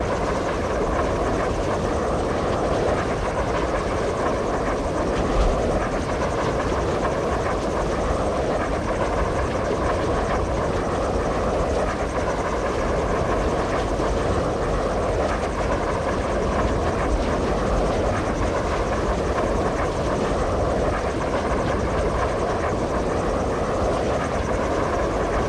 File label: Eagle Ambiance; unsighted